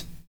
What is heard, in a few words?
N.Y FILL; Univers Sons